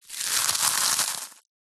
food crispbread squash crush in hand

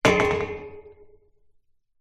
wood branch lean against metal gate pole; foley

